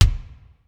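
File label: brit rm kick; sr16 bd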